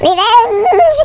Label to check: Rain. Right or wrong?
Wrong